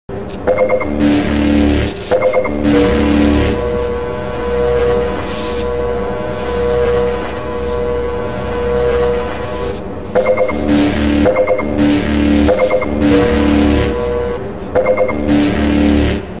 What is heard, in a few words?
hev suit charge; hl2